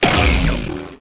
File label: reactor cap backfire; stars